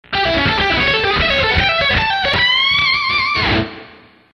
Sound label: Lick